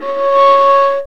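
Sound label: strings viola, chamber harm; String Master Series; Combos 4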